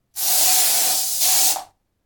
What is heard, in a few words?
Airfreshener